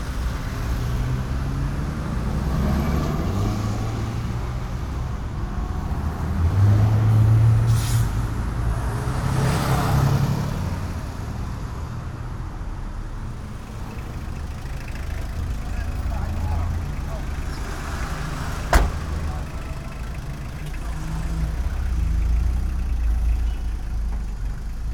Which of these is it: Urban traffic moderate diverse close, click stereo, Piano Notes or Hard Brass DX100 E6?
Urban traffic moderate diverse close